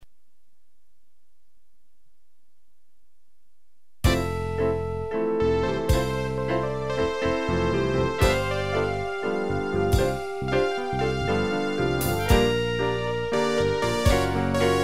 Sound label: with saw lead